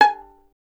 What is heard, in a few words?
STR VLN, Solo Instruments; Miroslav Vitous